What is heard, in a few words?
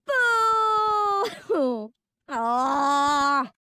Woman Booing; Zomboid; Lifestyle